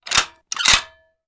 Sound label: Rifle Foley; Sound Ideas; Guns